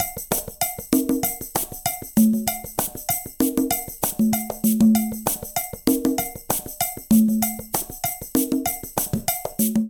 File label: Soul 97bpm, Latin Percussion; Ableton; Factory Packs